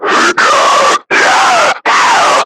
Screamer Idle; Warframe; Lasrian

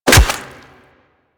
Melee Staff Impact Flesh

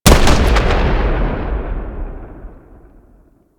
Torpedo Explosion, weapons; Grn Torpedo Pistol

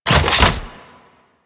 Medium Door Open; Crp Gas City; Corpus

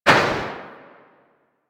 SMG Tail; Weapons; Warframe; Tenno; Sundial

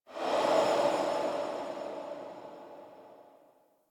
Museum Pedestal Light Whoosh; Warframe; Vitruvian Museum

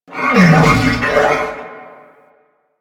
Wolf Pain; Animals; Warframe; Quests; Duviri

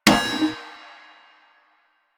Street Sign Destroy; Warframe; Ambience; Nineteen Ninety Nine

